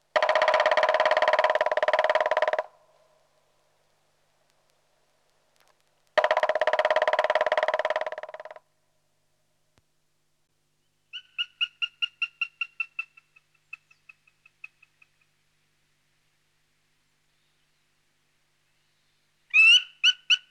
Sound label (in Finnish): Palokärki; lintuja; nauhalaisen aanimaailmat